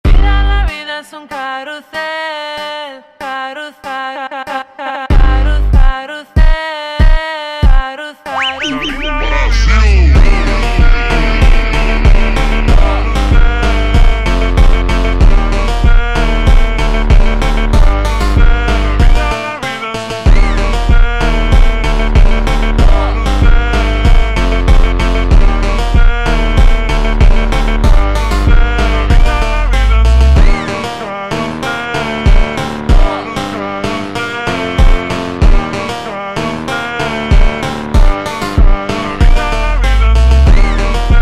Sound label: Montagem super Slowed